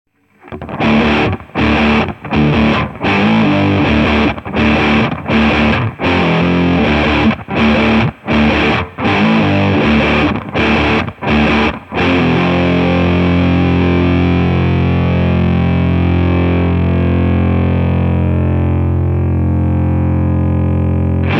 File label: Express Burn